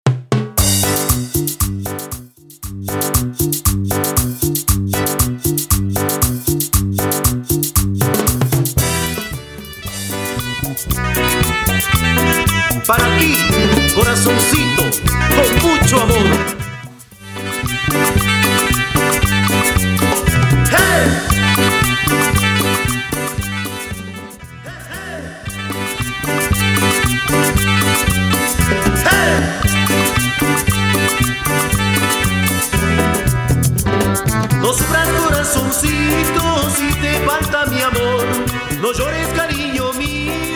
Intro Simple, 117 Bpm

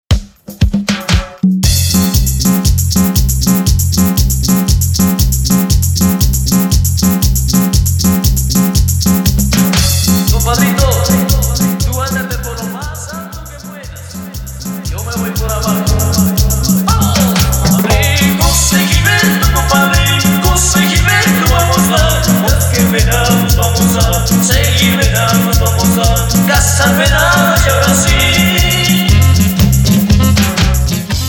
Intro Acapela Percucion; Dj Bayron Suqui Dj Mix

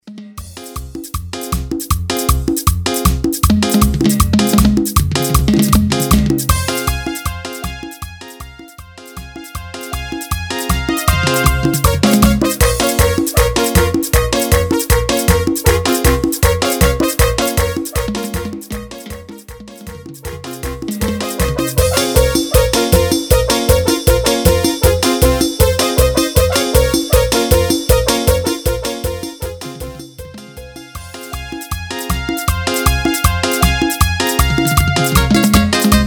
Instrumental Ec, Bpm 157; dimax dj mix

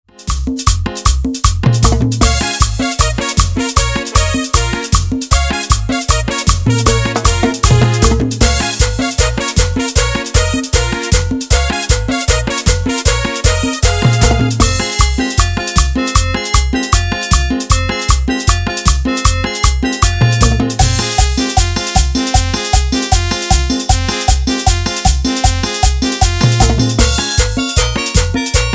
Instrumental, Bpm 155; Dimax Dj Mix

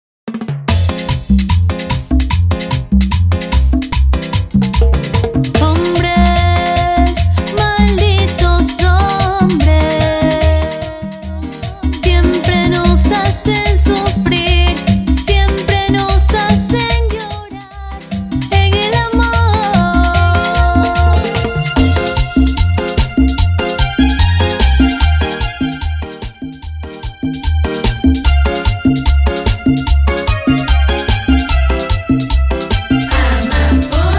Intro Acapela, 148 Bpm

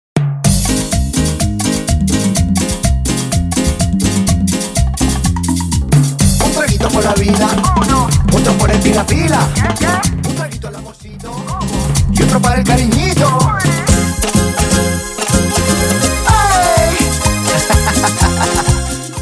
Intro Animacion 125 Bpm

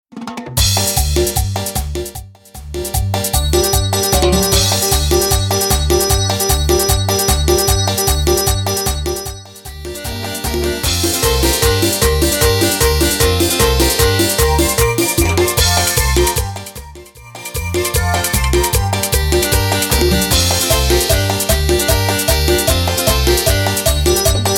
Instrumental Organo, Intro Percusion Druums, 152 Bpm

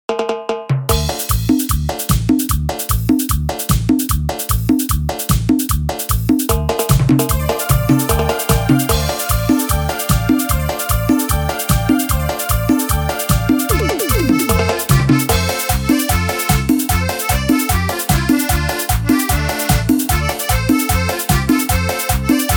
Instrumental BPM 150

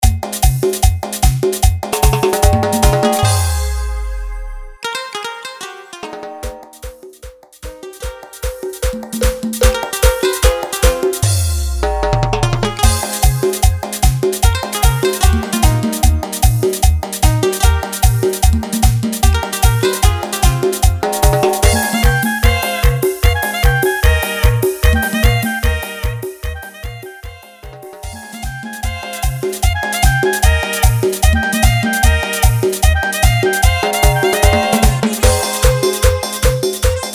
Instrumental BPM 150

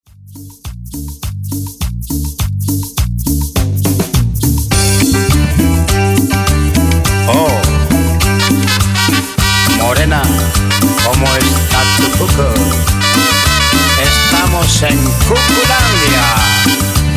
Extended Cumbia Colombiana, Bpm 103

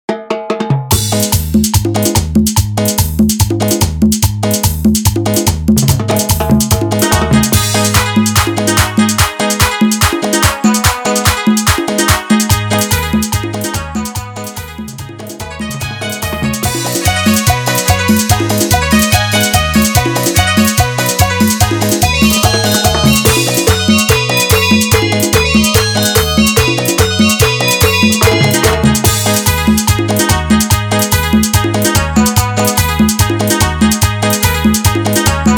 Chicha Instrumental, BPM 145

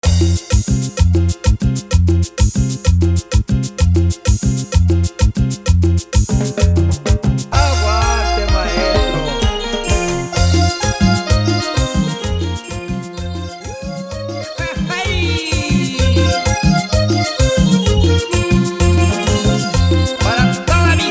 Intro Percusion, Bpm 128

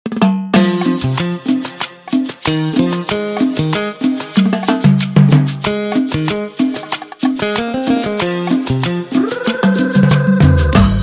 Intro Acordeon Acapela 94 Bpm

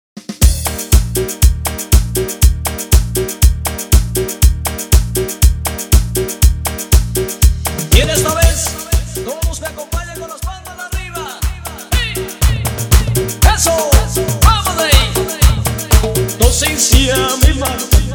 Intro Acapela Bpm 120

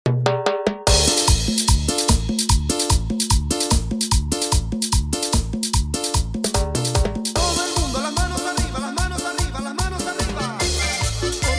Instrumntal Yumbito Bailable Intro 148 BPM

